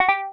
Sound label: ui confirm; grunk; Global UI sounds